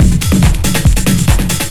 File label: SPEED, Big Beat Drumloops